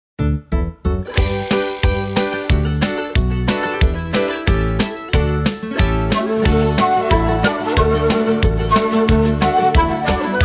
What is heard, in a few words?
jingle bells